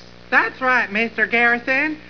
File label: hat